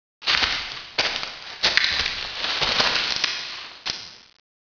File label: dead tree